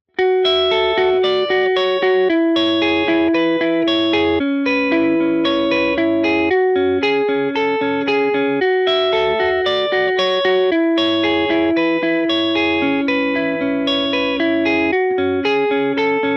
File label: clean effect off